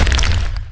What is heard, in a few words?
break wall; Undercroft